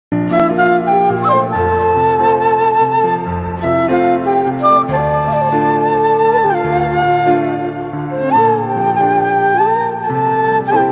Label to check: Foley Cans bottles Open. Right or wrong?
Wrong